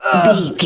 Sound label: voice male grunt pain